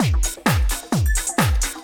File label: drum; 130 BPM